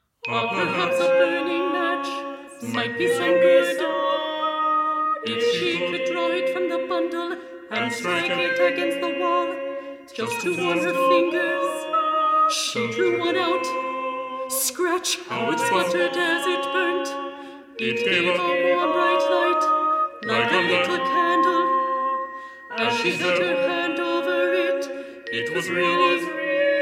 Version For 4 Voices & Percussion; No. 8, Ah! Perhaps

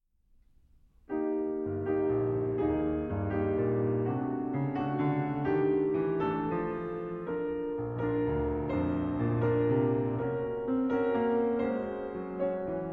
Piano Concerto No. 3; Glass; Movement I

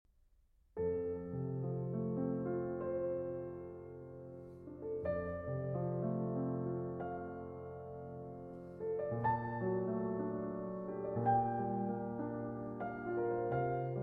Cello; 4 Lieder, Op. 27, TrV 170 IV. Morgen!; Arr. For Cello and Piano

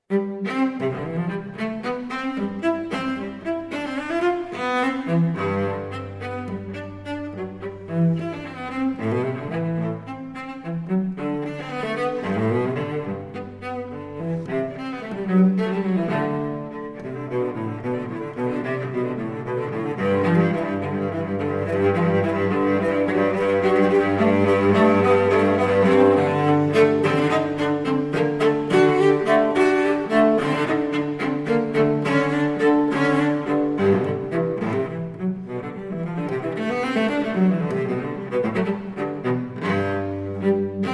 Cello Suite in C Major, Gigue; Cello Suite No. 3; BWV 1009 VI.

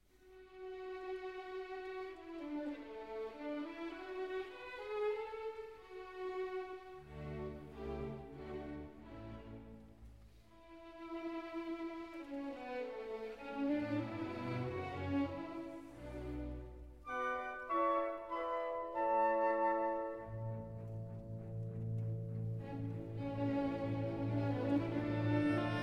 Violin Concerto in D Major; Op. 35, TH 59; Tchaikovsky

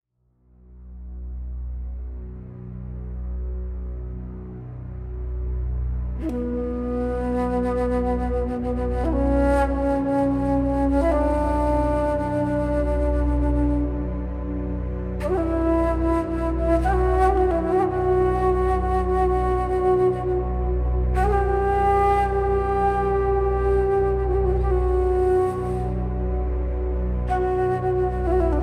Emotional Oriental Flute

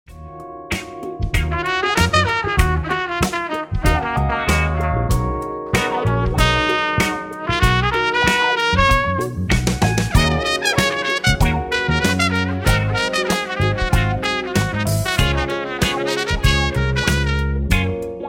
Trumpet Lounge

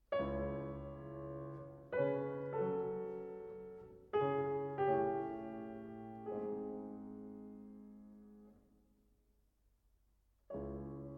Nocturne in C-Sharp Minor; No. 20; Op. Posth.

